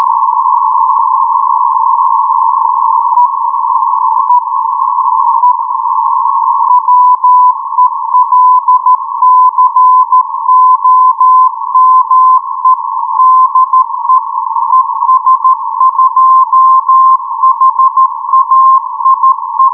CW beacon; eshail-2

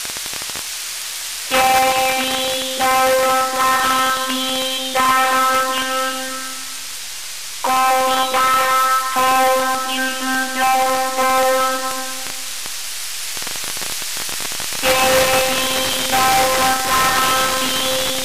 Digi Singer; HORYU-4; JE9PEL; 2001 a space odyssey opening

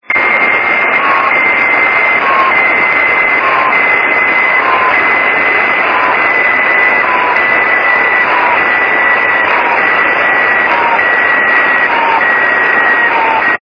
cw demodulated; prisma

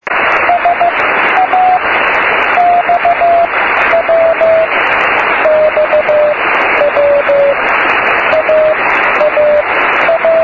cw beacon; xw-1

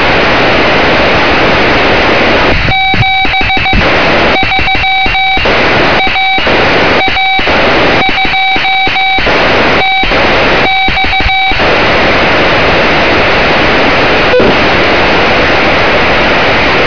morse beacon, FM; 50USDSat; AA2TX; Dayton Hamvention; MO-76

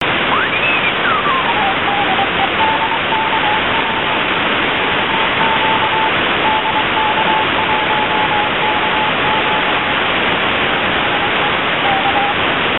cw; ao40; 24ghz

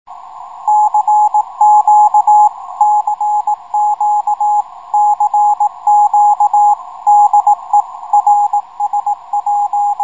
robot

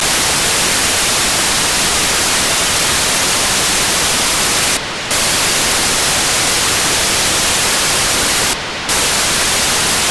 9k6; TIGRISAT; 435000

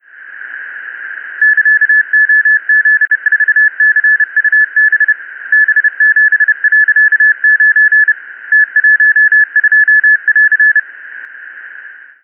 CW; USAT4; AIRSPY

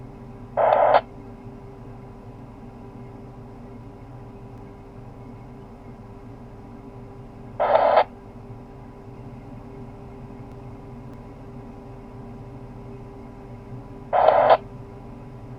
fm-fm-fm

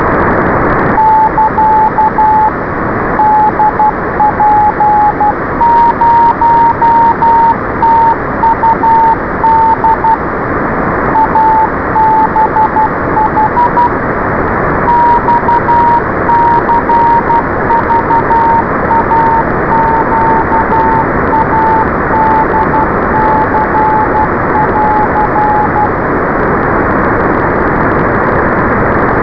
CW; SOMP

